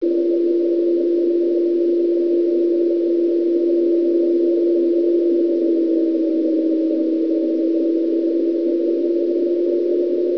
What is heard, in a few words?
beacon; AO-10 衛星; WAV フィルター処理後